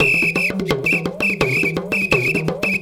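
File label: tama foudang groove; Best Service; World Colours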